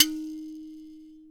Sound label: D#3 M BIRA; AKAI S6000; WorldSounds